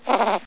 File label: light sound